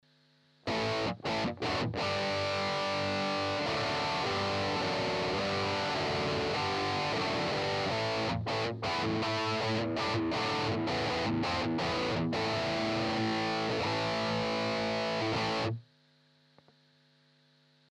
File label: EHX 5150 Rhythm Strat DI Out